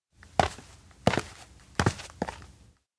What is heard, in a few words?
steps indoor post office walk; koyomi; anemoi パッケージ版 1.1